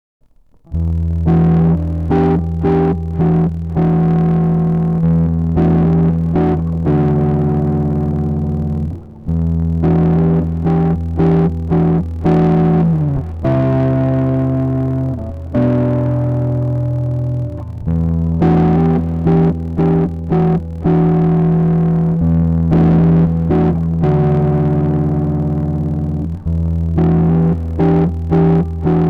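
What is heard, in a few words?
Em 112 guitar riff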